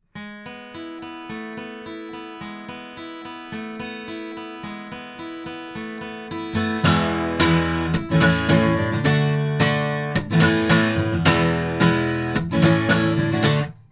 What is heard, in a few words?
Oktava MK012 omni guitar